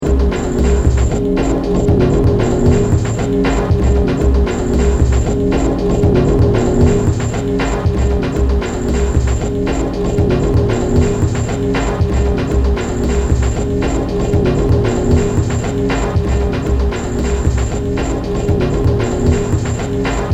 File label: Electro Breakbeat